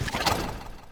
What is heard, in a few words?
MOVE; C64 Dreams; Multi-Sound Default